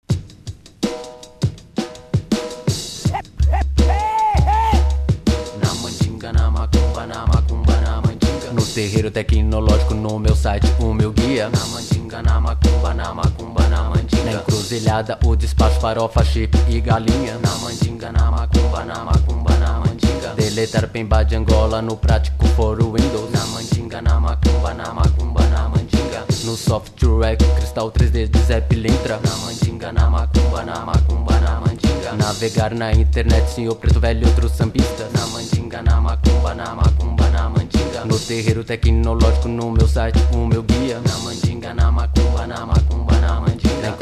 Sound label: terreiro tecno; Terreiro / tecnológico; Internet é vista também como um / espaço legítimo para a presença do sagrado afro-brasileiro